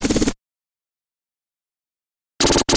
Rapid Fluff, Taylor Grand

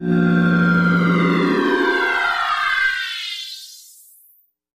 Harsh Modulated Sweep

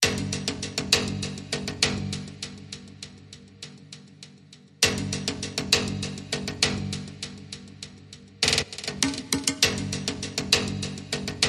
Beat Only

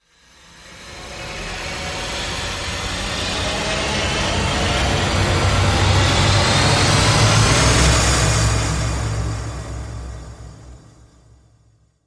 Raiders Jetbike Rise

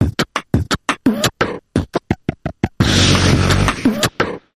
Comic Mouth Percussion Variation

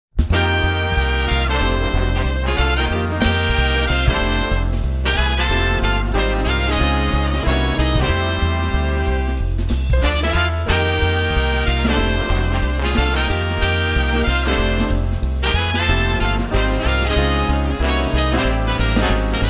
Jazz Brass

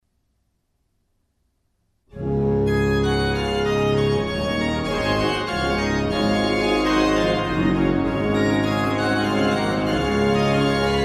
Praeludium per Organo pleino; BWV567; Johann Sebastian Bach

